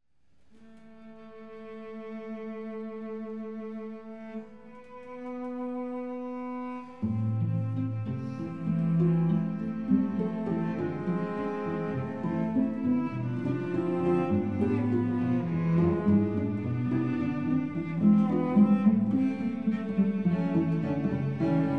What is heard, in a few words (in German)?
Schlussakkord, cello